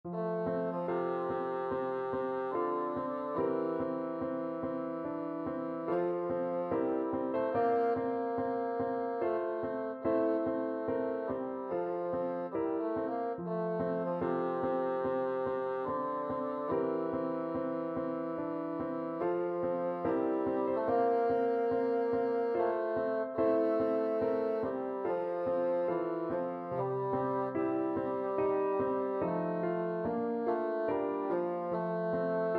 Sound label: bassoon